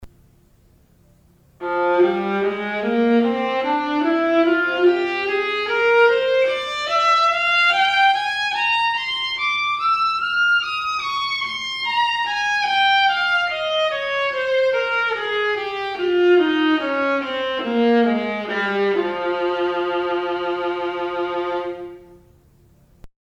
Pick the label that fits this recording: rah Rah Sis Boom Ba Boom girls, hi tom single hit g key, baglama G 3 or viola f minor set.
viola f minor set